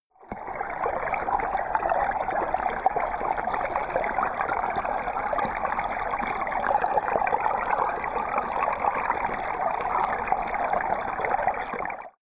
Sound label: stream